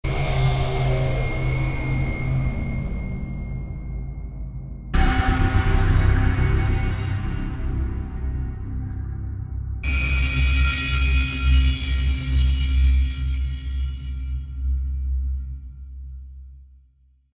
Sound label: Stings Elements Reverses and Sweeps Menus Hits; Natural Forces